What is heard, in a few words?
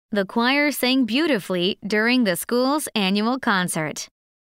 choir